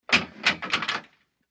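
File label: British Std Call Box Lift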